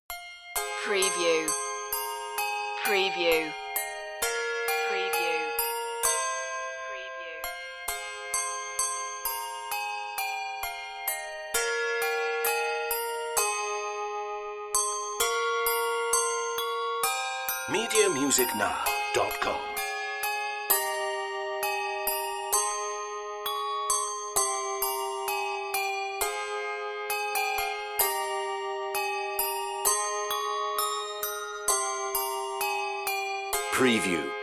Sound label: handbells; god rest ye merry gentlemen